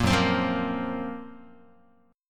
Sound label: F sharp Major 7th Flat 5th F sharp, Guitar; 4A,4E,5A,5D,5Gsharp,6Csharp; strummed; 1,2,3,3,2,x